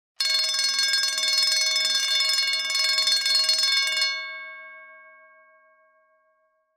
Fire alarm bell emergency steady ring short

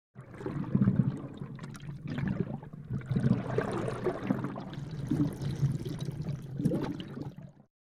Fish movement underwater perspective

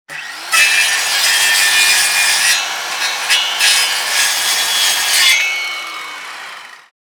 Industrial circular saw cutting metal

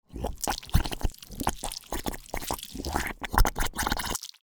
Cartoon accent slimy bubbling air releasing

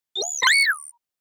Small robot talking style beeping

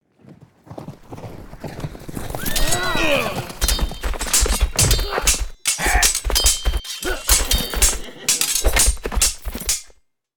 Mounted knight duel sword strikes and impacts

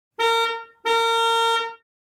Car double car honk short and medium

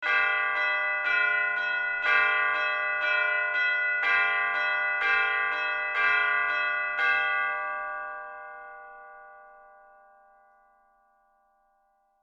Church bells ringing close perspective

